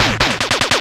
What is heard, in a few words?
Snares Perc; FX; Jungle; Renegade Snares Perc; M8